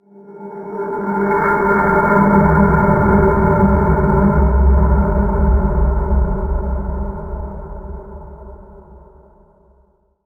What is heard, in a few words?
environment stinger